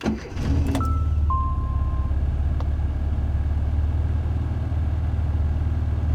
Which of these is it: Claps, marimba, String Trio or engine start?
engine start